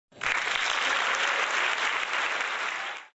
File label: win applause